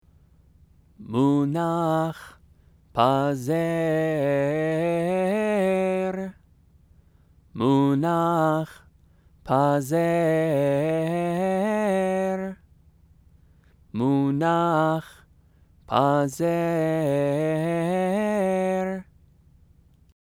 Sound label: megillah; trope